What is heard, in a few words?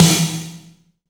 Snares; CMI